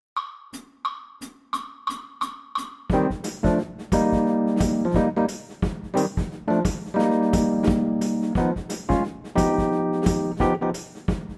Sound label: Bass PLAYALONG Jazz Etude 2nd LINE; All-State; Jazz-Band